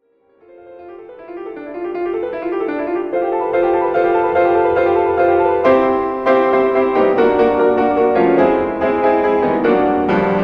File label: pour le piano prelude